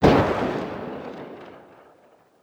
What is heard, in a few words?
ak74u attack distant; Rust